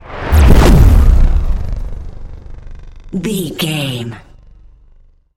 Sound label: sci-fi vehicle thrusters engage